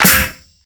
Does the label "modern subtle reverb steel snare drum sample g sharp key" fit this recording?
Yes